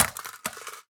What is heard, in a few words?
phys mag drop ar metal concrete, magazine drops